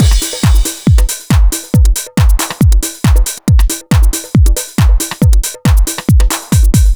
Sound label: Dance Drumloop; Drums; Dance Beats